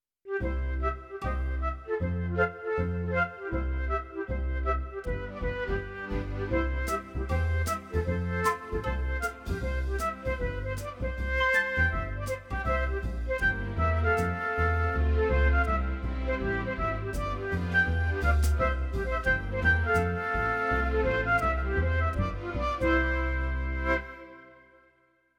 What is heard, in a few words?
Flute